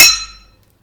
Metal Glass; Collision Sounds; clockwerk opensim

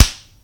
Flesh Flesh, Collision Sounds; clockwerk opensim

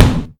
Terrain Plastic, Collision Sounds; clockwerk opensim

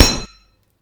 Plastic Metal; Collision Sounds; clockwerk opensim

